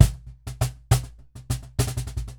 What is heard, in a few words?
Cajon Loop 100BPM; musicradar